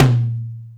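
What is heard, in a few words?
Tom Lo Slam, Alesis D4 drum machine; 300 Drum Machines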